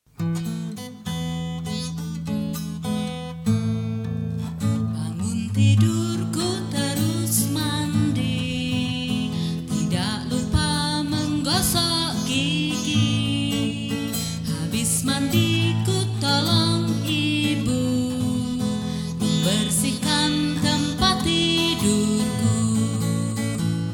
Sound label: Wake Up Song